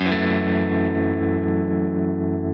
F#m7 Arpeggio down

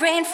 vocal

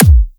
kick; osu

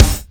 drum hit normal

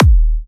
drum hit normal

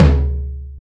drum tom

